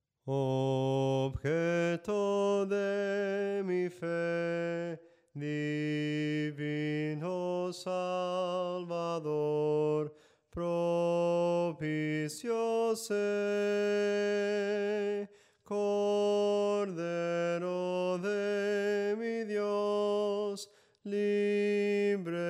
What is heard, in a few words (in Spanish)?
SOPRANO CANTADO, himnos en melodia soprano; cantos del camino